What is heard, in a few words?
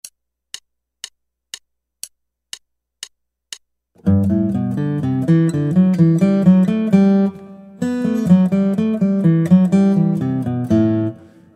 Guitar